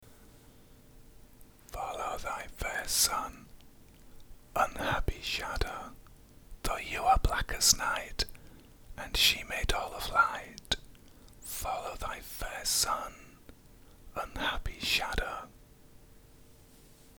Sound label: Em 140 renaissance guitar impro